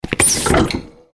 door

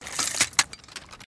Chain

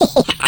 skelly medium

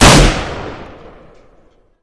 usp, weapons

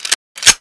glock18 clip in, weapons

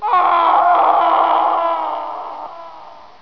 fall scream; zombiepanic

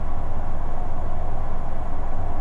pipes

